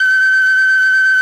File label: flute A, FLUTES; Best Service ProSamples vol.55 - Retro Sampler; AKAI